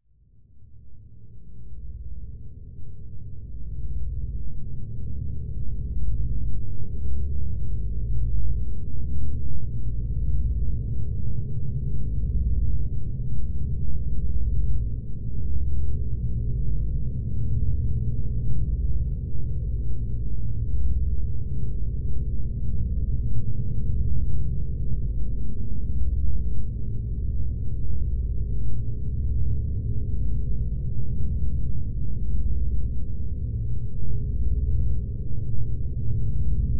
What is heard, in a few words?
science fiction forcefield energy source dark droning subtle